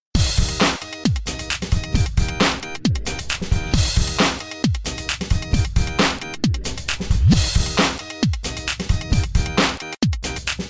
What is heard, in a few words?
grandmas organ, bed